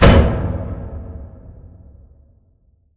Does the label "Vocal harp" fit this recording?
No